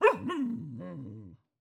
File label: bark, light, vocalization, light, wavering; Epona